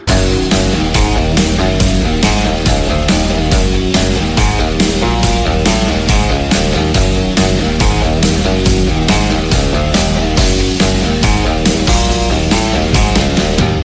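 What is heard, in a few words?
guitar telugu music ringtone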